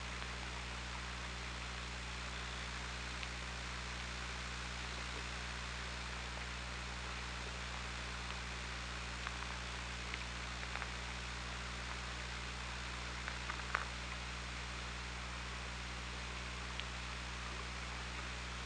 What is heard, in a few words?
OrcaLab